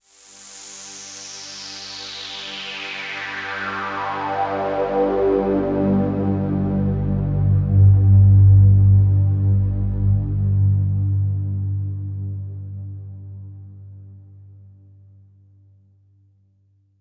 Sweep Pad; Dr. Fink's Funk Factory

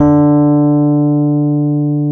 D 1, Electric Pianos; Wizoo

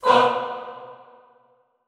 FAH B2, Classical Choir; Best Service; Extended Classical Choir

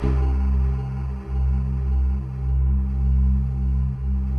soft voices, Soft vox; Optical Media International; Sonic Images Library

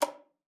KLPA D4, ALTOSAX; Xsample VOL-2

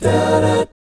AB MI7, GUY CHORDS; Spectrasonics; Vocal Planet; Jazz FX

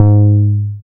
BAS MKS-50, Roland

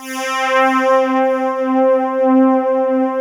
PULSATN, PULSE; PULSENSE

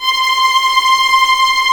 C6 TREM, VLNS TREMOLO, String; East West; The Ultimate String Collection

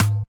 EQ Perc, Percussion Mix

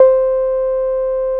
SIEL, String Machines

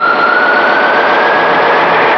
Ancient Noise FX, Industrial

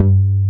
MOOG SOFT BASS; Pro-Rec - Synthsonic; BASSES

